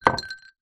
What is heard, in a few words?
food drink glass ice liquid down table